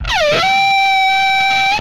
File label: Skid, retro arcade; MMRetroArcadeSoundsPack; Vehicles